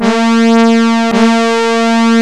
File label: ENSONIQ STAB BRASS